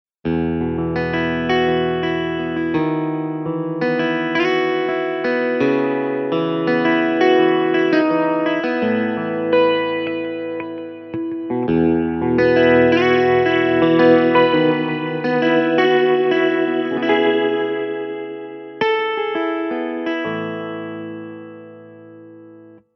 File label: Clean Pick